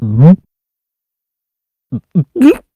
burp

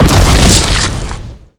explode gore

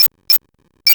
startup

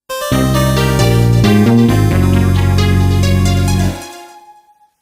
jingle

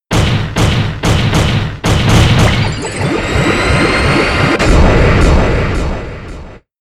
transform; athena

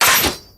breakdown; sorin

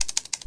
type short

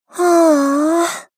oooh; chihiro

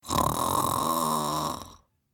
snoring; kzjoutarou

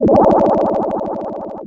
drown; sonic

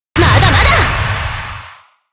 burst; arcueid; mbtl

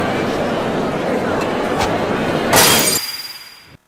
bottle

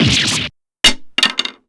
damage

